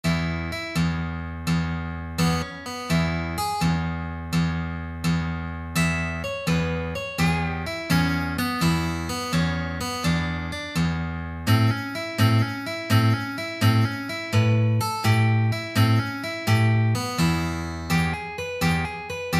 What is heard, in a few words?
Classic Fingerpicking in E